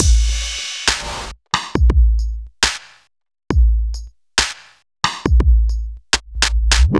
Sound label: Bpm137 Dubbe Break, dubstep drum loops